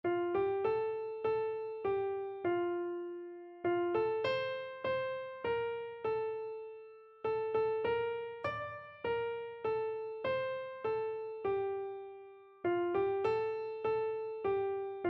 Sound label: trust and obey, keyboard